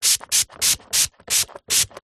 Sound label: spray insect repellent pump x6 sprays; household; zapsplat